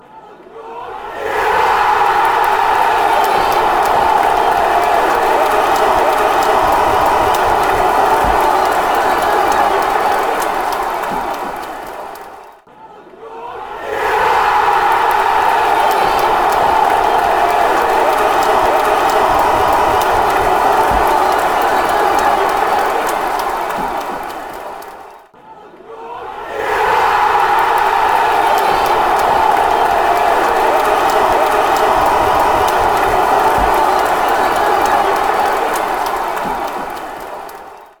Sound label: goal, fan chants